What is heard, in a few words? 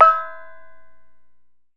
E3 SOFT, Kye Waing